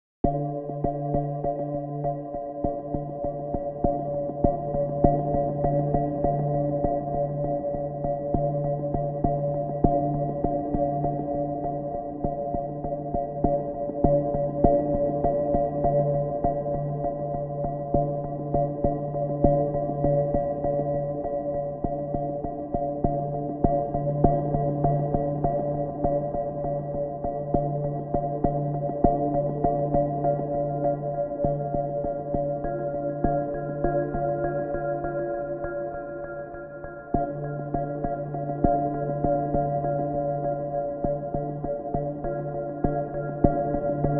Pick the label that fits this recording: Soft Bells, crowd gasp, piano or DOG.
Soft Bells